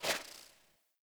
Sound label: UI Theme Dialog Open; Zephyr